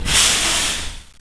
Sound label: blow, weapons